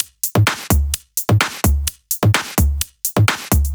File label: Dirty Dutch Loop; Mutekki Media; Vengeance Electroshock Vol.2